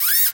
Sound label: Pinky servo; monsters vocalizations; DoomRTX